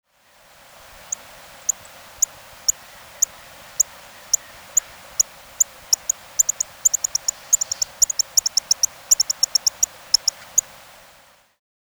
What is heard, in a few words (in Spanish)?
Ejemplo de sonido, Anfibios de Cuba; Guía Sonora de los Anfibios de Cuba; Fonoteca Zoológica